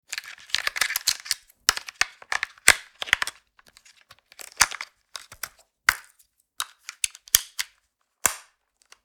Remote TV controller changing battery; appliances

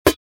Cooking pan light hit impact; utensil

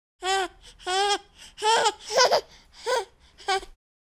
Baby girl cute laugh giggle

